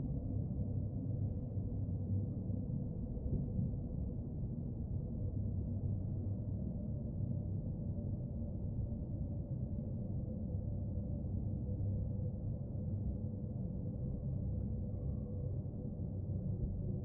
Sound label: amb nightcity interior; midnight guns